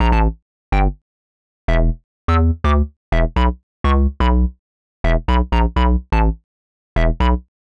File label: Hard Synth Bass E, 125; PowerFX - Nu Jazz House